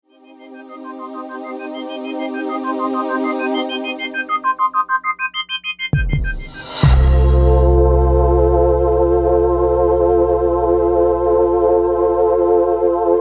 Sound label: Science Fiction Light Theme